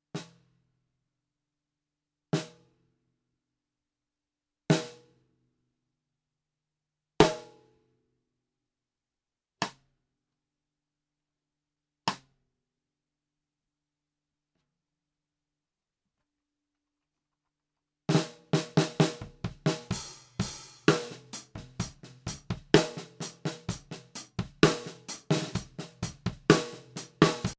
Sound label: TAMA LST1455 low